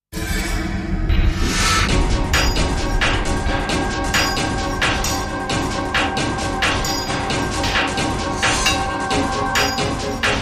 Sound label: Metallic District